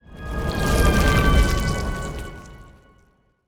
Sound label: ant ship transport move; Stellaris; biogenesis; ships